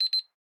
Timer Beep, alarms; Huawei P20

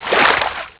plant spits water; Nokia; 5800 XpressMusic; Bounce Touch

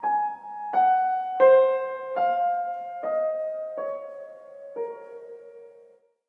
fade, Classical Harmonies, notifications; Google; Google Sounds

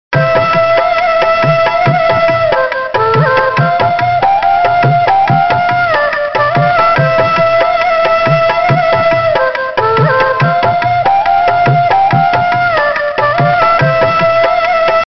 Indian Flute; Retro Ringtones

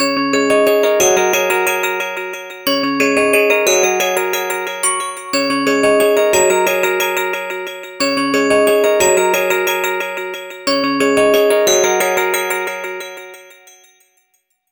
ringtones; Sapphire; HTC One M7

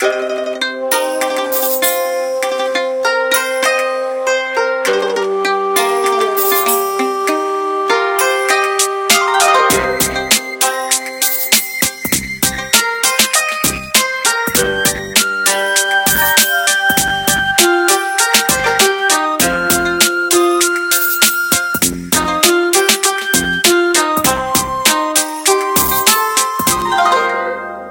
music bamboo, ringtones; Samsung GT-i7680; cmcc

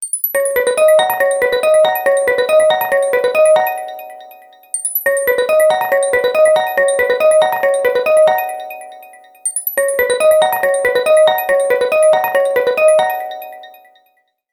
ringtones; HTC; One-M7

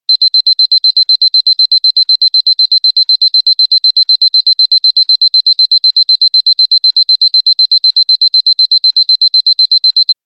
Electronic Alarm; Infinix XOS 15; alarms

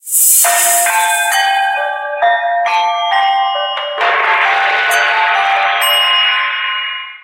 music temple, ringtones; Samsung GT-i7680; cmcc

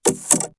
camera shutter; LG; U970 Shine

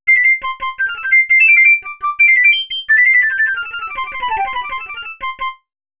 hornpipe, monophonic; Kyocera

